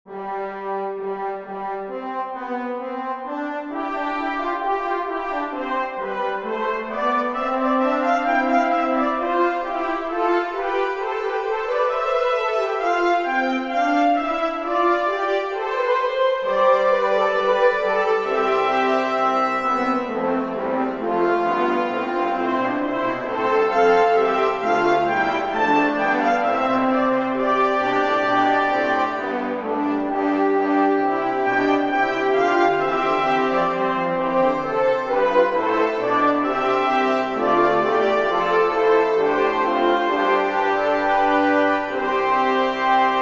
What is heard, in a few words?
brass tutti; AB Sinfonia; hollywood